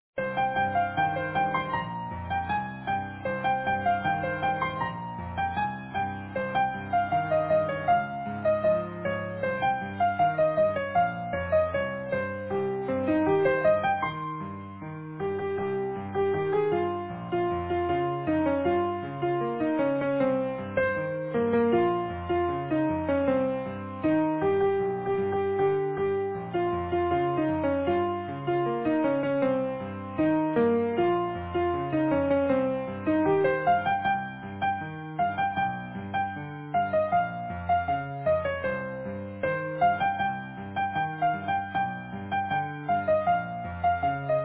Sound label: easy piano